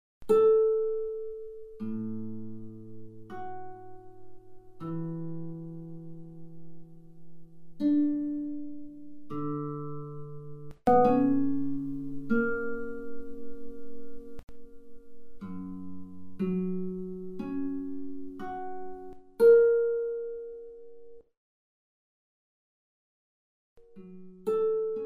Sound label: Lute